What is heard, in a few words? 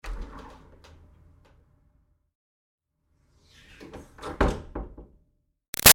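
Door Wood Office Push Hard Open-Close; Miscellaneous Doors; Sound Effects:Doors; Big Room Sound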